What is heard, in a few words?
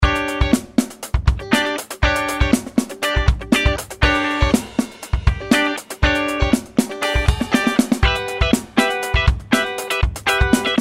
Electric Guitar Funk Components